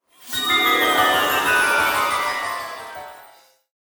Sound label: Magical Fairy Entrance Decending